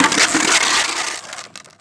board bail, skater; Tony Hawk's Project 8; XBOX

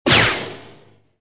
green lightning

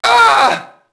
mobster get hit; Max Payne

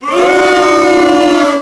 boo; WhiteOut; XBOX; crater

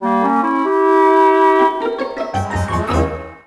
Expectant

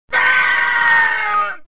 death; Star Wars Jedi Knight II Jedi Outcast; XBOX; reelo

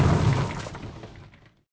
ball 2 bort; shipcharge; Pirates Of The Caribbean

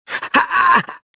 choke; luke

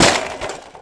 bmx fall; terrains; Tony Hawk's Project 8; XBOX

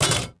pipe hit metal; Star Wars Obi-Wan; XBOX

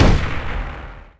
impact; Splashdown; XBOX

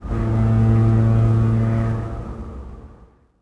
air Horn, ambience; Star Wars Obi Wan; XBOX; coruscant

